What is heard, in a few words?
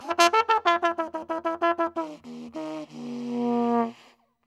TRUMPE, Trumpet FX; Roland - LA Composer vol. 2